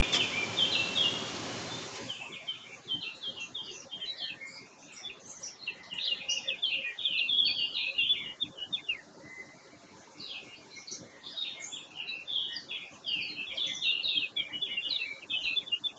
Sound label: dawn chorus